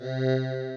Wave Vox

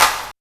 clap, percussion

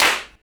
Clap Hit; percussion

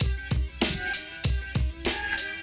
Loop FX

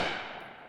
Shot Reverb

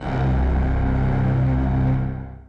Boot, FX Effect Sounds

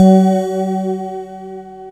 Detuned Synth; instrumente

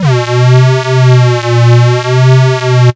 Moog; Emerson 70

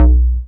Jam Bass

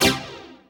dance chord; instrumente

